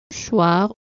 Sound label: choir